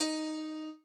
piano

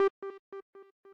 arp

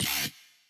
wobble1

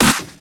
fill

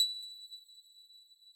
plack

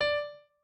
piano

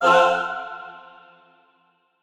chor

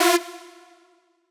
synth

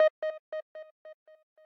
arp

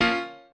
piano

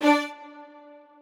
strings

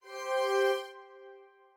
strings

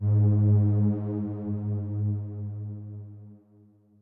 bass pad

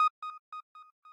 arp

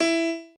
piano

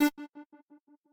synth

